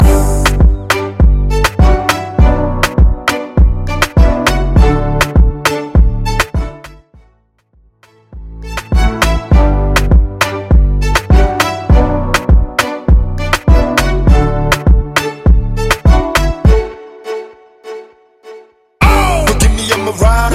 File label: Top40 Urban Latin